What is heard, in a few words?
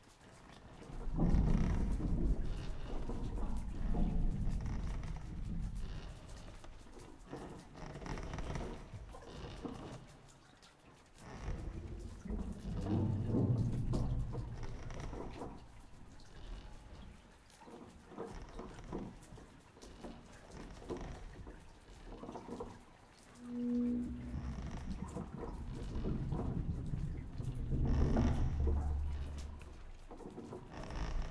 amb bilgewater ship